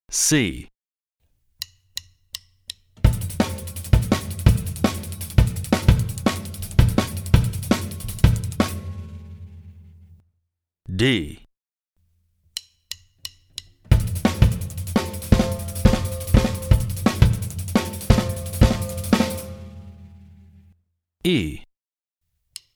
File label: Drum n Bass Beats; jazz drumset